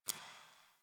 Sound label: Derelict Interior Water Drip; Warframe; Orokin Derelict